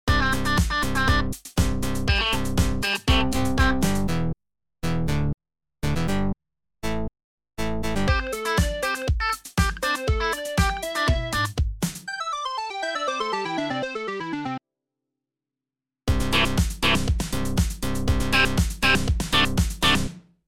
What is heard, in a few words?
Hip Hop Summer Beats